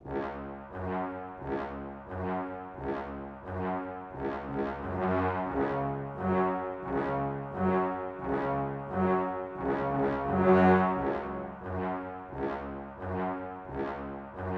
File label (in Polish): klasyk brass